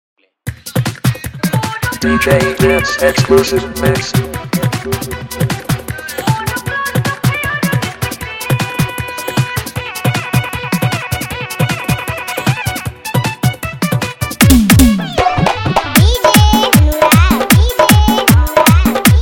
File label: Dhollki Dance Mix